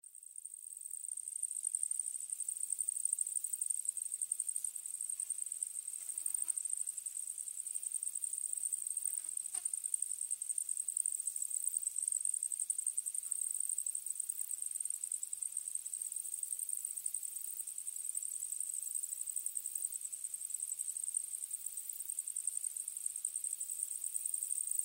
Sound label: Early morning crickets and goats